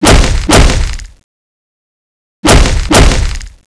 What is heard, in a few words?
attack tentacle; cstrike; dione